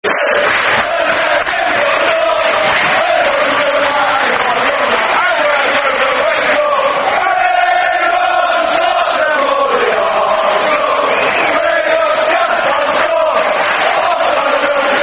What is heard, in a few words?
Who's That Team They Call The Chelsea, Football Chants (Soccer Song)